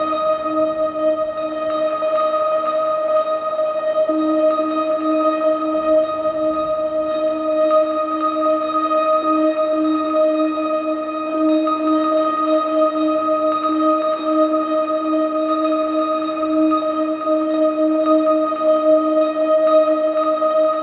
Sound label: epic tonal atmospheres d#; paragon